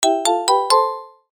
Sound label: cartoon ascend short; zapsplat